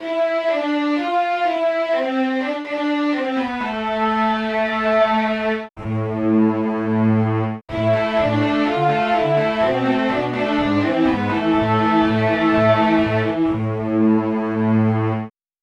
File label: tremolo